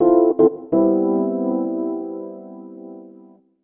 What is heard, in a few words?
SYNTH HOUSE 125 A, Keys; eJay